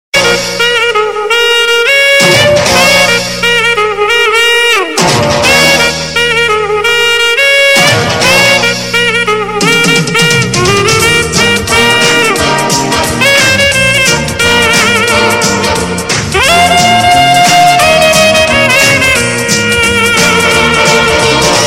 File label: Inst